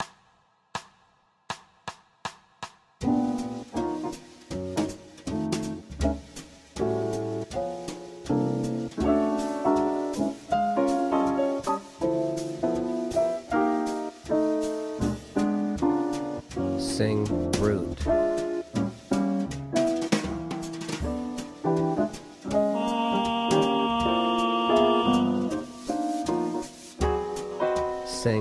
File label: Bb Rhythm Piano Sing Tenor; rhythm changes ear training; Applied Jazz Ear Training; Practice Perfect